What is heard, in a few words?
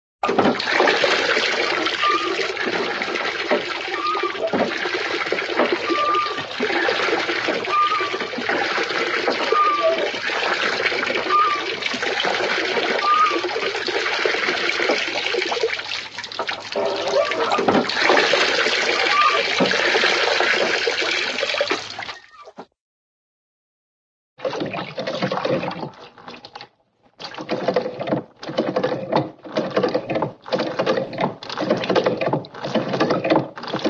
pump, hand old hand water pump; cartoon